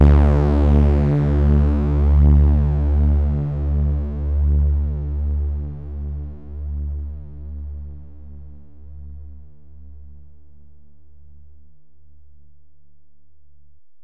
Bell, Alesis DM-PRO

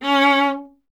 viola C0, Solo Instruments; Viola 2; Miroslav Vitous

